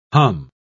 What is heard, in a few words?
hum